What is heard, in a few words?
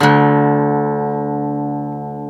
GTR 12, Ac 12+ E.12; Club-50 - Foundations Roland; Dual Guitar